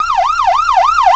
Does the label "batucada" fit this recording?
No